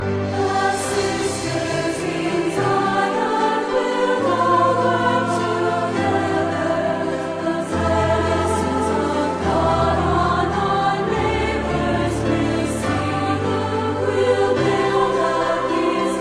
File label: melody